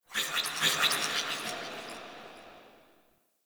Spirit Chatter; Sentient Lair

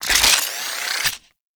Reload, Weapons; Warframe; Tenno; Protea; Velox Prime; SMG

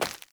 Grunt Step Default, footsteps; Enemy; Corrupted Sproutfolk

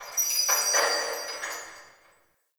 Town Door Bells; Ambience; Warframe; Duviri

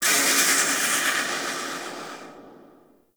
Ship Machine Steam Blast; Warframe; Corpus Ship Remaster

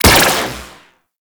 Dispersion Laser Fire; Warframe; Corpus; Riot Moa

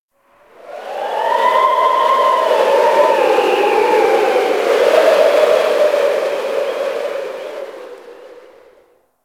Cold Wind Whistle, Ice; Warframe; Corpus Ice Planet

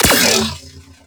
Shot, Weapons, Sentinel; Warframe; Verglas

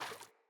Rat Guide Water Step

